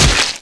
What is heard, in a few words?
bust flesh; svencoop; custom weapons; biorifle